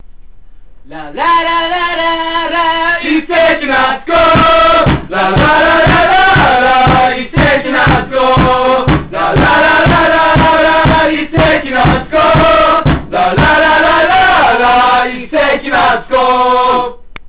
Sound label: song